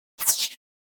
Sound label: Button Whoosh Sci-Fi Small Air Button Beep; Hollywood Edge; sound designer's toolkit v2